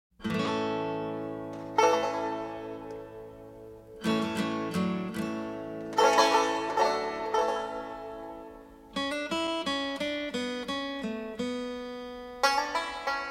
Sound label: Banjos